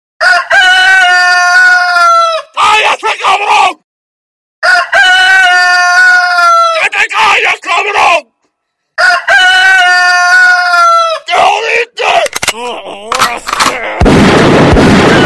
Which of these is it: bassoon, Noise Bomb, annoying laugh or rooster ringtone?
rooster ringtone